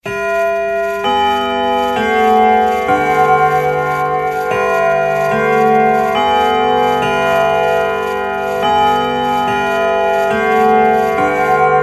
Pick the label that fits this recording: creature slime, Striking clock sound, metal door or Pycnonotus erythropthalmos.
Striking clock sound